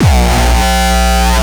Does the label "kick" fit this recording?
Yes